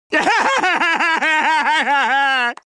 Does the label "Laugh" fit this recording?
Yes